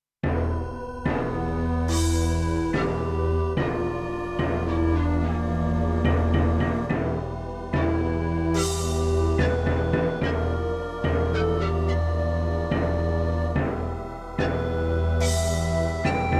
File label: CM500 GS; LSL3